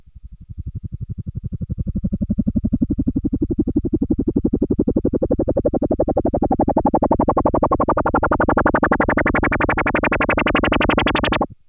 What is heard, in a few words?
Juno Chopper